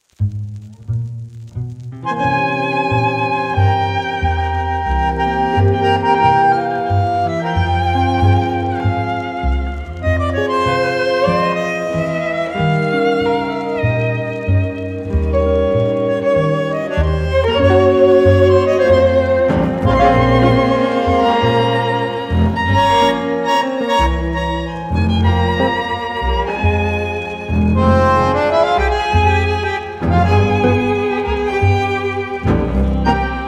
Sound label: tango Music aged